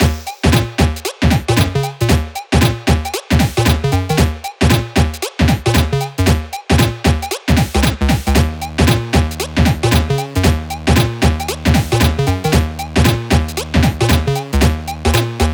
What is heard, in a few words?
Chiptune; Chiptune Music Pack Vol. 4